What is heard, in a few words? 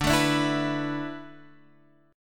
D 6th Suspended 2nd